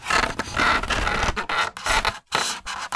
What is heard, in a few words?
Polystyrene Creak